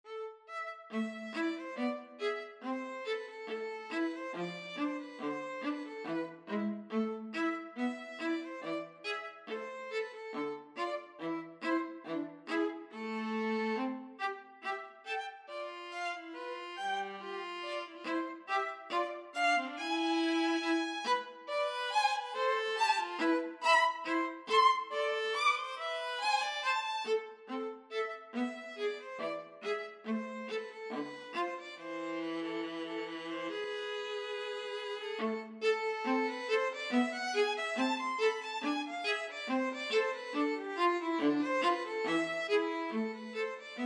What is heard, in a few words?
violin viola duet